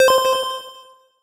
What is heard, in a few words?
pickup nickel